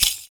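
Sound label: PERC, percussions; Univers Sons - Raricussions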